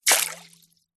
human spit water out into metal bowl short small amount fast